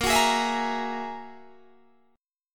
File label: Ab 7th Suspended 2nd Sharp 5th, chord